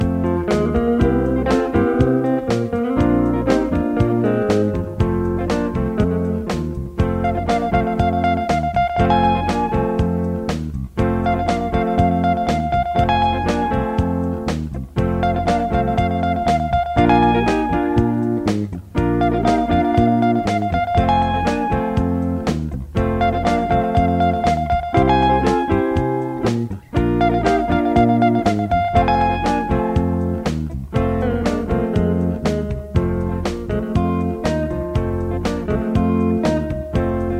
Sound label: guitar